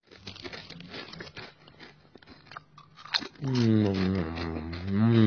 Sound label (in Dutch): chips eten